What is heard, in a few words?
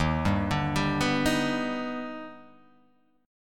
D sharp Augmented Major 7th D sharp, chord